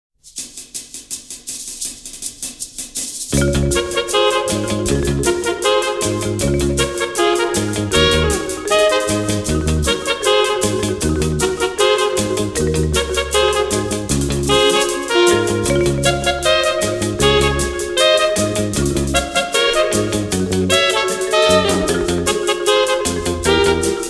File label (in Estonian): Instrumentaal; Eesti Filmimuusika; Eesti Kullafond 1